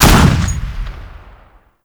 Heavy Pistol; Warframe; Grineer

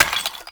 Clay Pot Destroy; Gameplay; Awakening Remaster

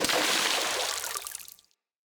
Impact Debris Medium Water; Weapon Impacts

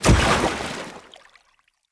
Blunt Heavy Impact Water, Weapon Impacts; Player; Environment

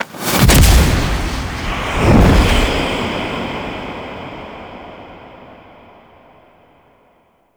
Lifepod Launch Pass By; Wraith Quest End

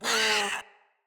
Kavat Vocal Pain; Warframes; Khora

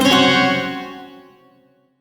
Shawzin Hirajoshi Chord; Warframe; Duviri

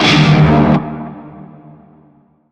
Shawzin Min Chord; Warframe; Narmer Shawzin

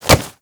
Generic Mantle Foley; Warframe; Characters

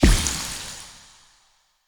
Blood Lust Hit; Fey; Champion

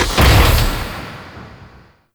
Large Door Close; Ambience; Gameplay; Warframe; Corpus Gas City; Gameplay Remaster

